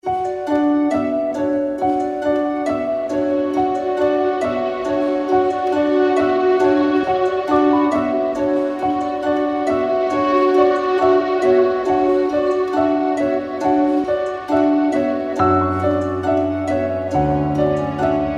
HARPE IN BLUE

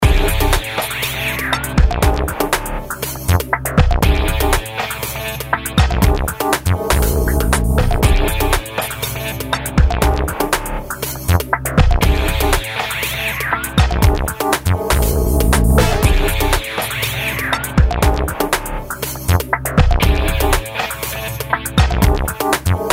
JINGLE

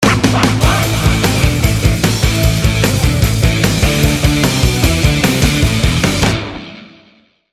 POP GENERIC

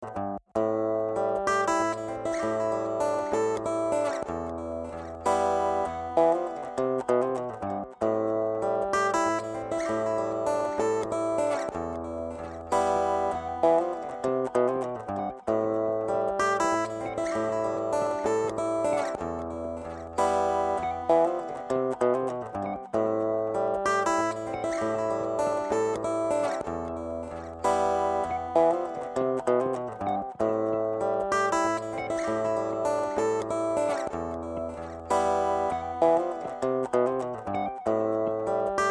AFRO FOLK

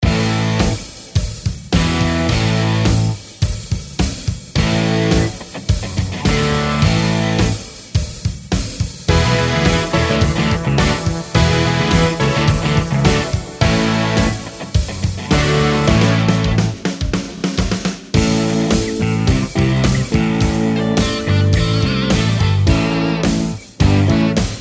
AMBIANCE ROCK

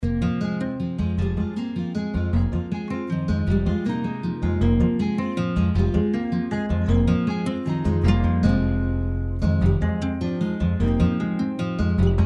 GUITAR

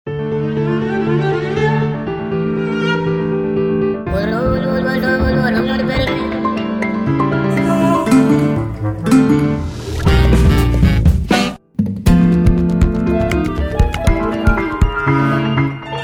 JINGLE

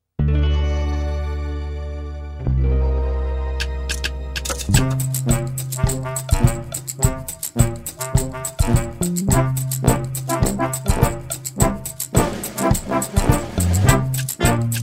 SALSA

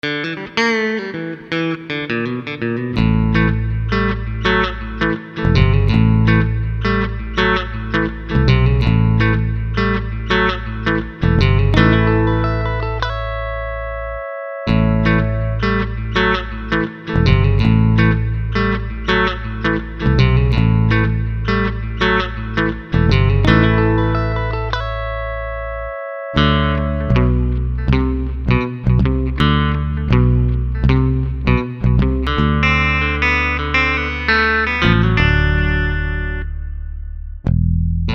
COUNTRY SLIDE

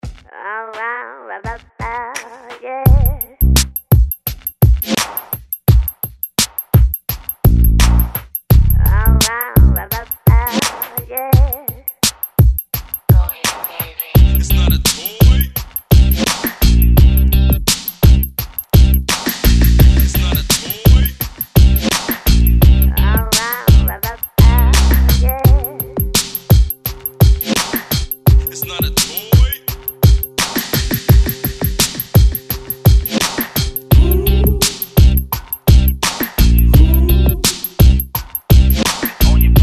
dub model

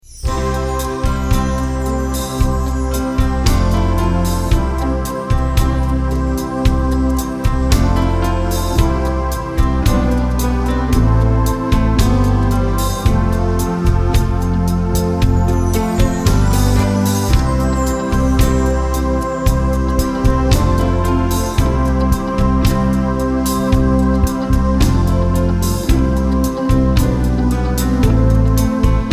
ROMANTIC BALLAD

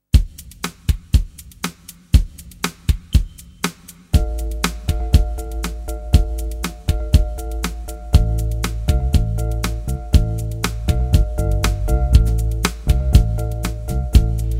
jingle

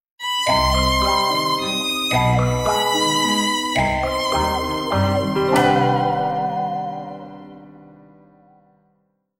JINGLE VIOLIN

